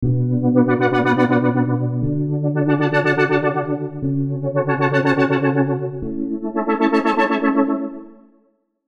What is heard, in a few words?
vital presets; chiqui audio; vital presets pack